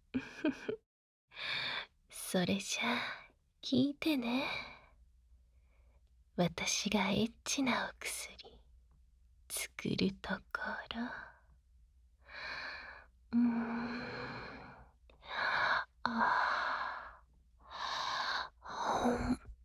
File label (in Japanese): 甘々 蕩ける 尋問 媚薬 耳舐め の 術, ASMR